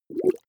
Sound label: small bubbles